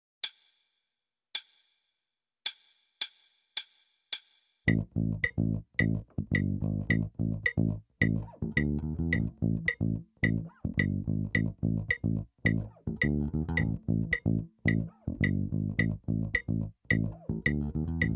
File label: Rock Funk Rock In 1/8